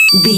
retro collect pickup coin

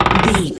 lever turn push crank handle med

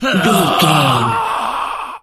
voice male death

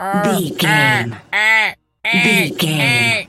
seagul squawk deep

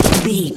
footstep gravel land

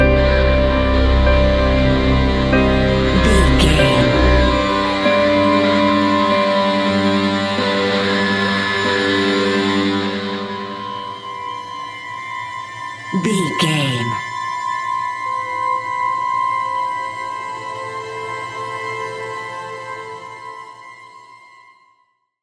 ephemeral shadows modern horror

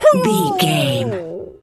dog hurt whimper howl long

